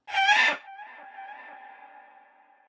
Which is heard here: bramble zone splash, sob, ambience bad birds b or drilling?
ambience bad birds b